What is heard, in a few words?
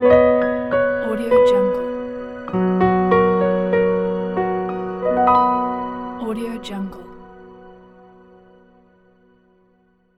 logo corporate piano; mandarin sound